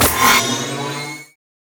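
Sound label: spell flux; LoL; ryze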